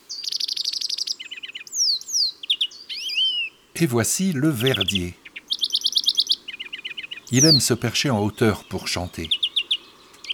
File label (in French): Le Verdier d'europe; chants d'oiseaux